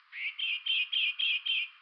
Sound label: FORTIS, Darwin's Finches